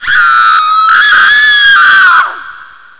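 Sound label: female scream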